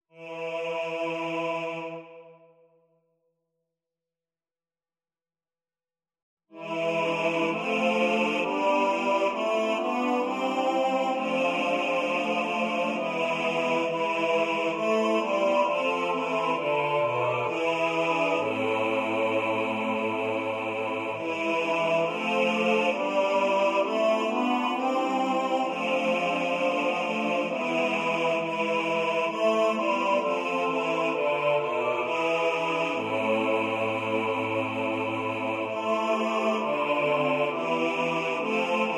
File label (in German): Bass; Bach